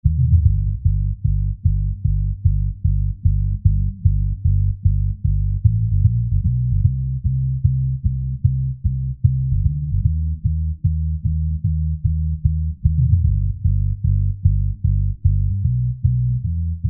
150Hz LPF

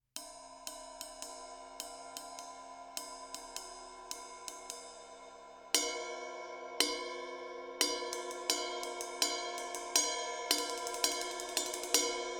Cymb Ride Bell

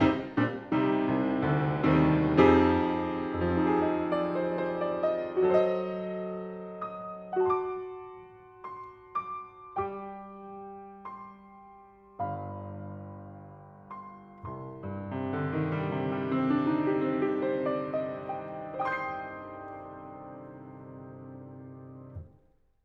AB Comparisons Cardioids 100cm

